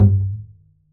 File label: perc, pizz; meatbass